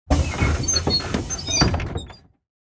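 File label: school bus door open; brakes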